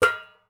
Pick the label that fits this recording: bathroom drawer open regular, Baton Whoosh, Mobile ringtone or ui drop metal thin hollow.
ui drop metal thin hollow